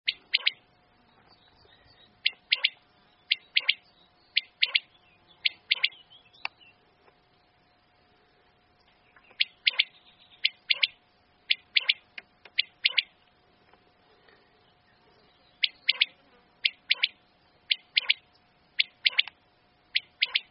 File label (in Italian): quaglia